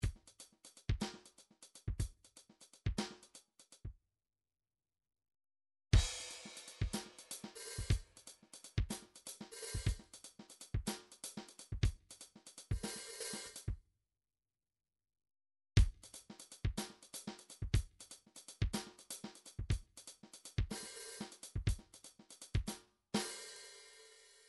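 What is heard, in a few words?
drums half time, midi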